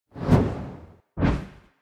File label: transition to island